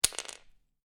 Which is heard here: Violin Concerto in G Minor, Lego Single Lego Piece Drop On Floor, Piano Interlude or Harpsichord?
Lego Single Lego Piece Drop On Floor